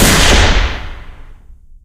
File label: sniper; bazaar bargain; tf